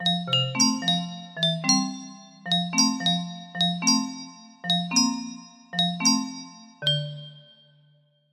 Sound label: melody, music box melody